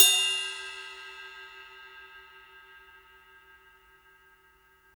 Drum Dry; East Collexion; Drum 1

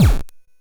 Drums, Hits; DCM8

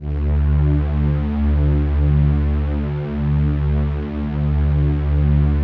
LUXURY D3, LUXURYPAD; Drums In Your FACE!

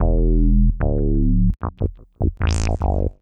Classic Bass, Drum N Bass

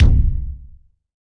BD, BASS DRUMS; EdgeSounds

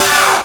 synth, g, Rave Synths; Best Service ProSamples vol.37 - Dance Synths

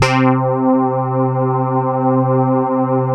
KITCHEN SYN; Greytsounds; Scott Peers Sound Engineering

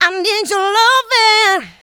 VOCA, Houseworx

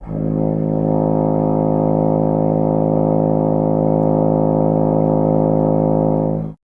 BRS TUBA, Solo Instruments

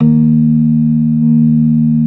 B3 D#3; Best Service Hyper Dance

